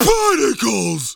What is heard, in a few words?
hit; patrick